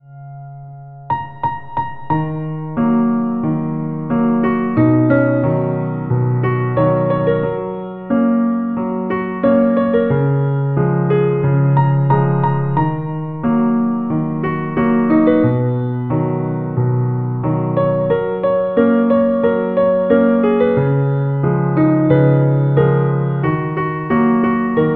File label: Piano